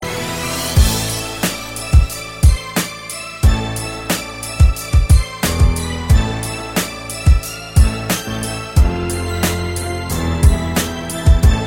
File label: Hip Hop Beat